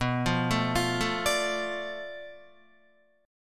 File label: B-Minor 7th Flat 5th B down Guitar; Bm7b5 arpeggiated